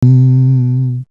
Beatbox; AMG

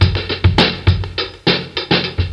fx, Beat Loops, 103; Zero-G; Monster Beats

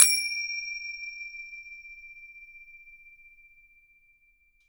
FINGERCYM, Percussion; InVision Interactive; GRUNT

